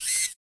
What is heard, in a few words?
gunslinger inspect finger move, weapons